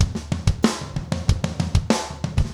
rock toms 90

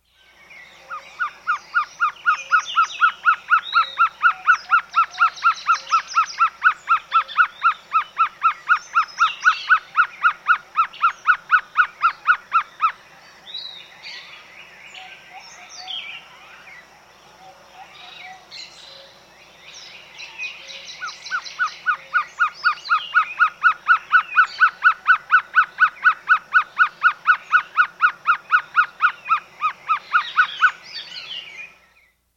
Violaceous Trogon; S. America

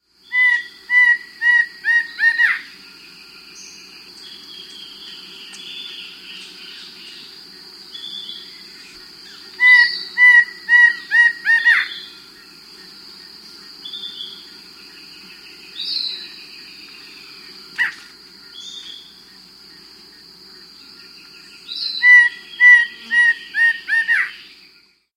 Mouse-Colored Antshrike, S. America

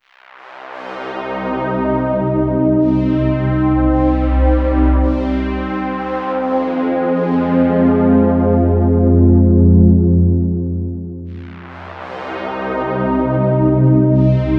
Synth, Rock

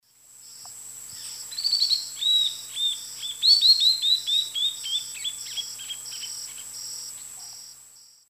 Notharchus tectus, song; S. America; Eastern Lowlands

